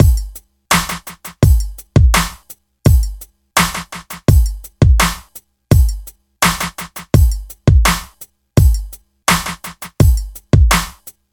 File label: 84 bpm drum groove A sharp key, kick tuned to the A# note